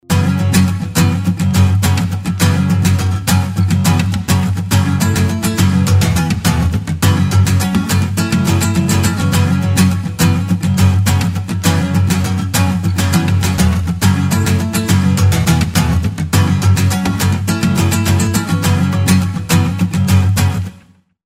ACCOUSTIC GUITAR RACE

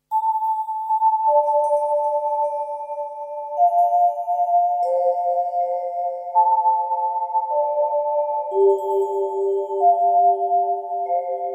CLOCHERIE BELL

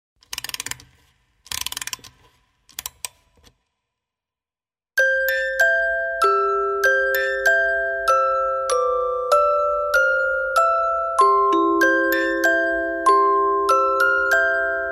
BABY TIN BOX

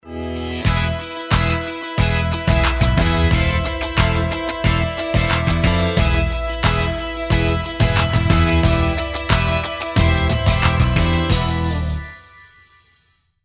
DUB FLAMENCO STYLE

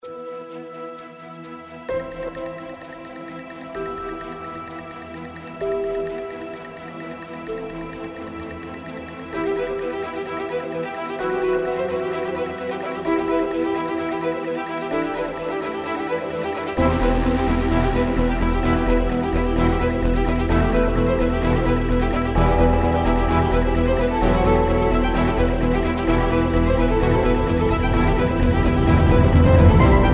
ARPEGGIO EPIC

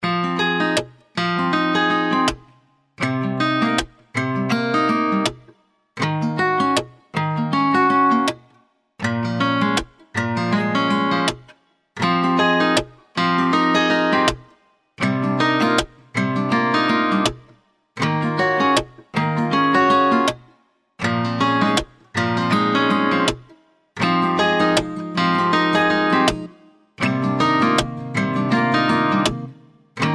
CHILL

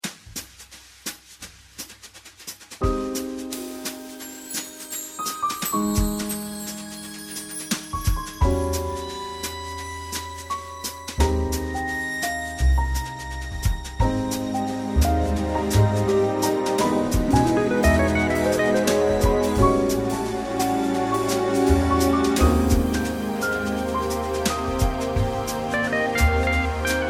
JINGLE JAZZ

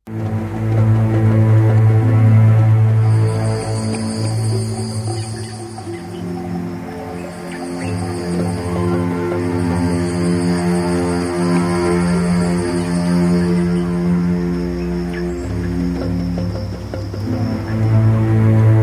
FOREST CAMP

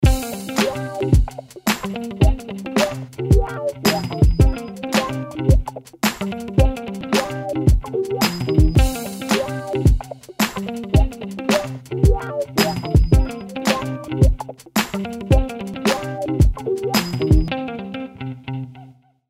FUNK

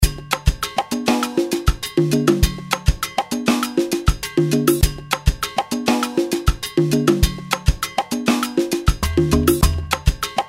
SONGO BEAT

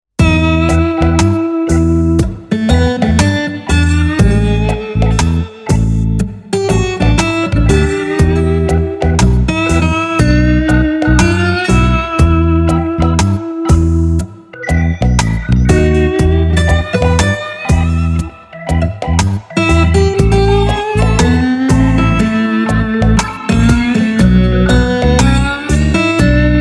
COUNTRY JAM

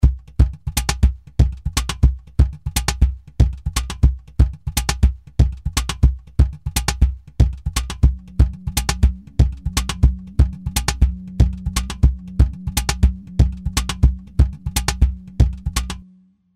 CAJON

